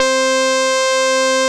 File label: Bass Synth Lead